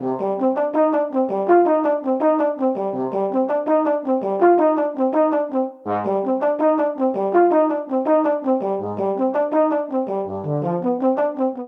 Ostinato Impending I - vi - V C Minor 82 bpm, Brass Quartet; MIDI Clips; Ableton; Spitfire Audio